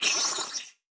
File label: Multimedia Internet CD-Rom Flash Wipe